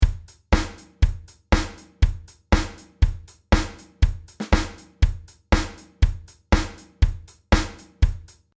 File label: Four On Floor 120